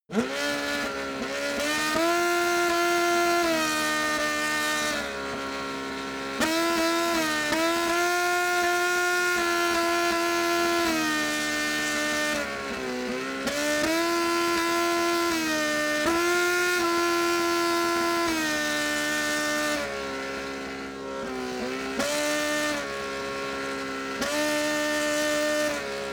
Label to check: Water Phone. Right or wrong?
Wrong